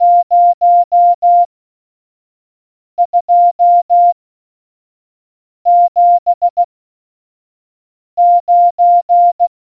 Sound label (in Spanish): Alarma